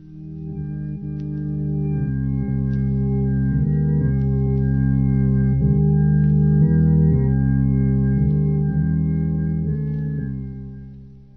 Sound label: keys, Sad